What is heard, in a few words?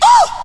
VOX SHORTS; Megabass Remix! 2